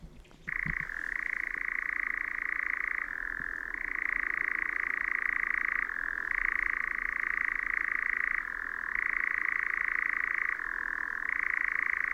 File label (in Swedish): Nattskärra Caprimulgus europaeus spel, Yttre Matvik